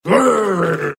Growl; Lugosi Karloff

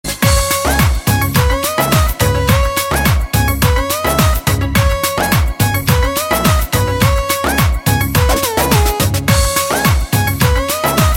Club Sound

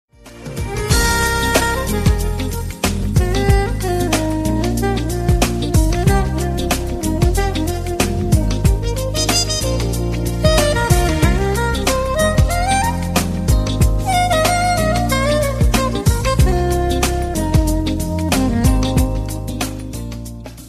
Grove Ringtone

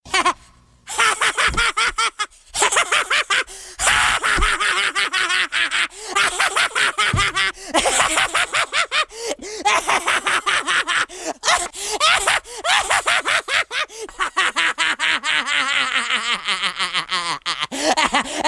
Laughing; Cartman

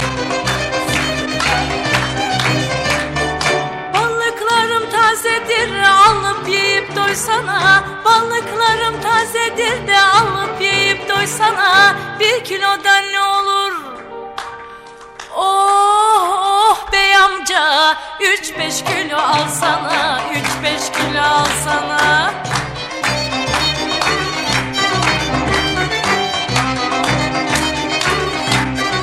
Turkish Movie Song

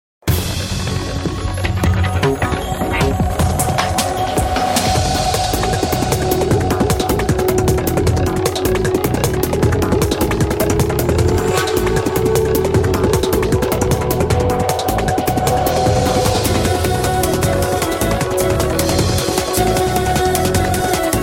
Think Music

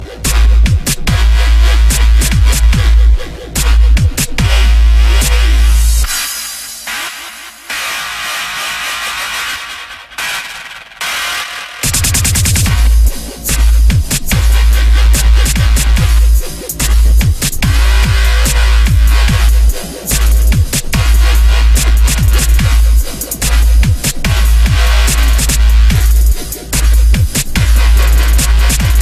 Dubstep; Creeper

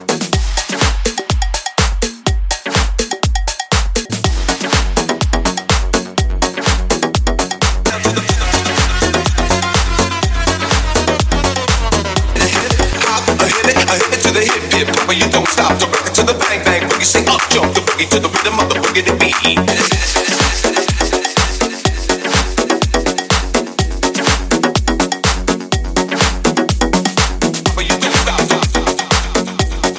Rapping Beat

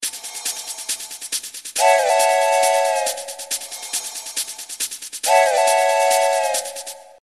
digital train

